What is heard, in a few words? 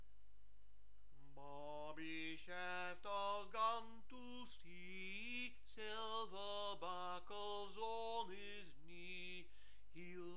bobby shafto sopr